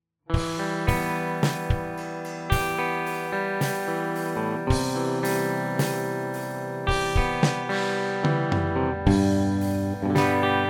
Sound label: CLEAN, guitars